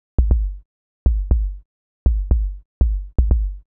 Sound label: Kick Low; hydrogen